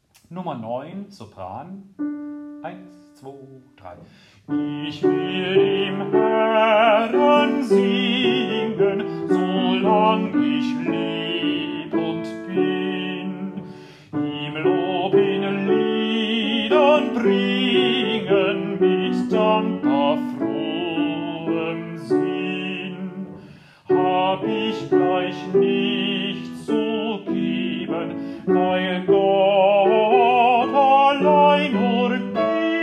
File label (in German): Sopran; Dioezesan Caecilien Verband; DCV Chorfahrt; Übaufnahmen